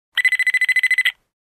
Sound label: Digital Alarm Clock Sound